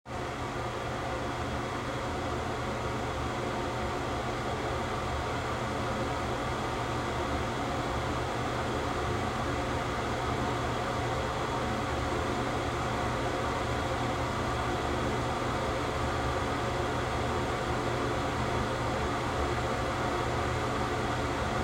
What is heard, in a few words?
Old Tv Static